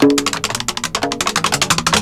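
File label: MUKONGO, AFRICAN RHYTHM; Crypton - DrumBase5000; AFRICNRHYTHM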